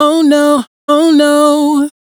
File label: riff oh no Am, Dry Vocals; Raw Vocals; Slate Digital Omnivox Vocal Sample Pack